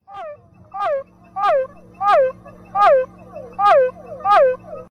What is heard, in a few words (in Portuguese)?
O nome cientifico desta especie e Physalaemus cuvieri, mas popularmente e chamada de ra ca; rã-cachorro; o alerta da ra cachorro sobre agrotoxicos a base de glifosato